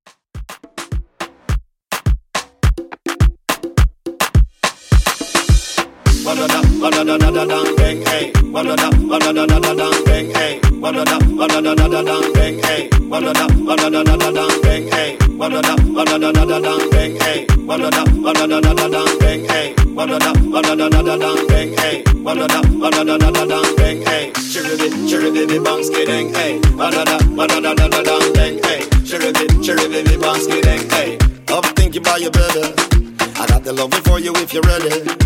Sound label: Afro Drum 105Bpm